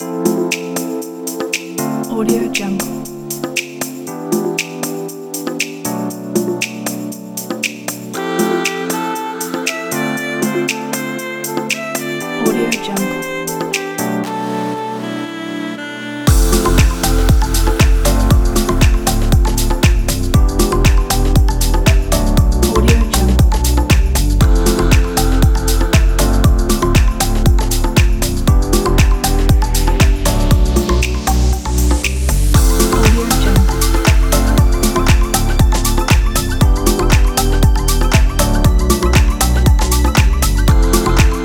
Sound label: chill lounge fashion house